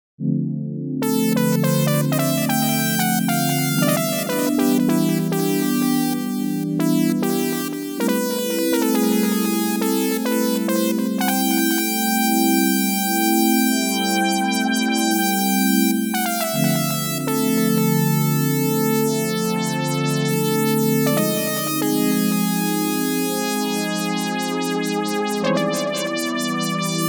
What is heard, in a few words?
Pad Poly